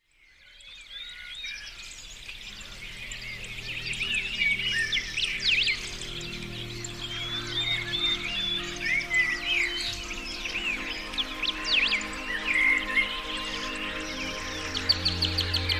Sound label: Dawn Chorus